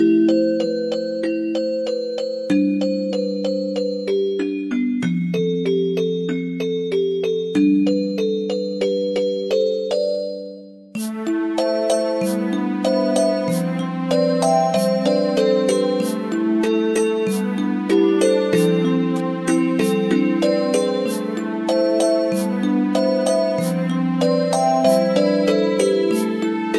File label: MIDI